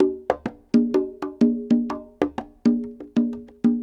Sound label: PERC LOOP ETHNO 125